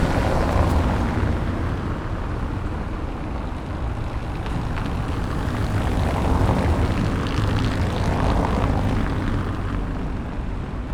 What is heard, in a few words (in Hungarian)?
bazaltkoven gumiabroncsok hangja